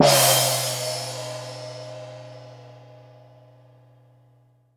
GONG, CARTOON; 1000fx

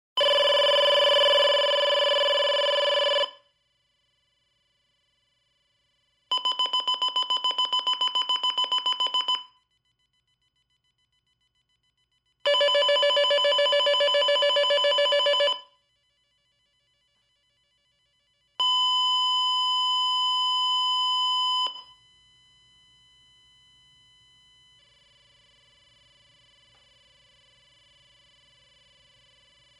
Security system test mode; SOUND EFFECTS1

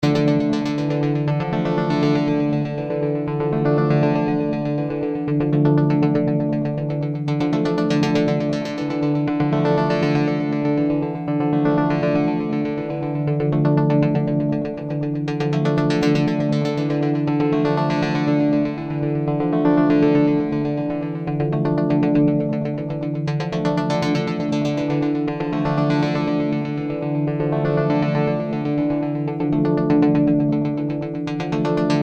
Arp

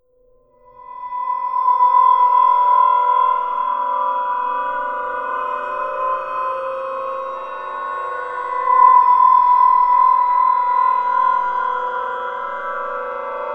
Wind Chime Drone; Best Of N2O Library; Drones & Foundations

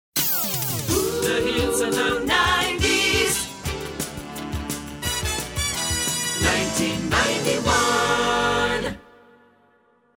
Hits, Drops

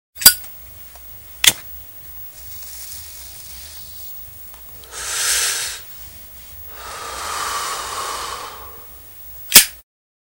Zippo Light; 100 sound effects

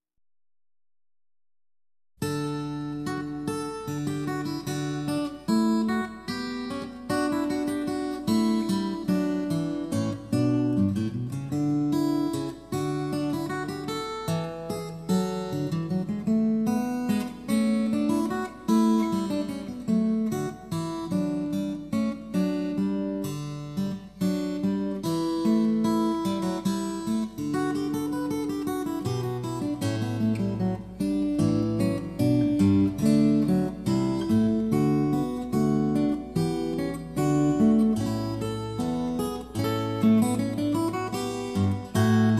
recercar, steel string guitar; The Siena Manuscript

